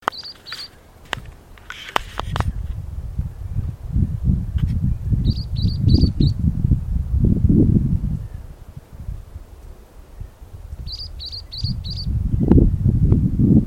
Tacuarita

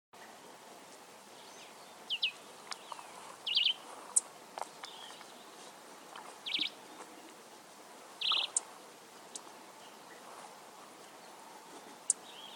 Frutero Cabeza Negra (Nemosia pileata); Stereo

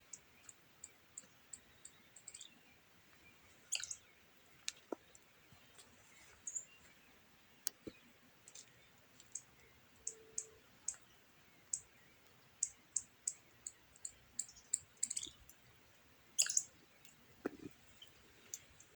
Viudita chica

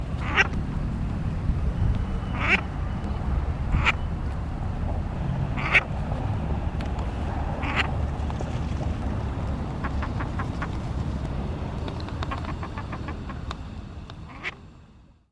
Pato creston, Pato Crestón (Lophonetta specularioides)

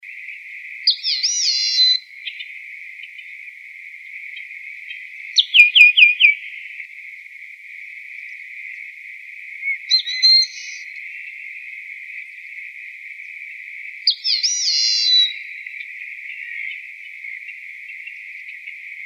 Varillero ala amarilla, Varillero Ala Amarilla (Agelasticus thilius)

